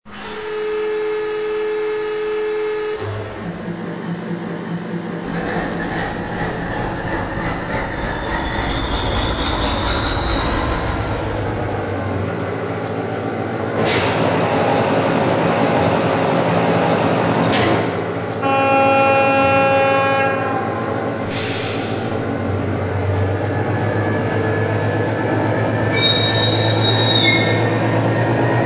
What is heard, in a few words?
TEP10 Stereo